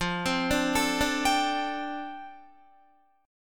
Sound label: F 6th Add 9th F down Guitar, chord